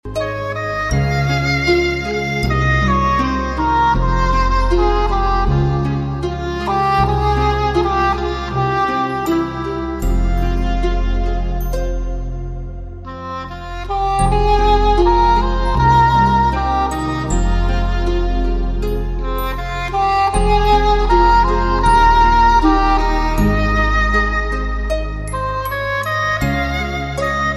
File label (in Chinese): 彩铃背景音乐